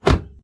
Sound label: door close; Golden Crayon Sounds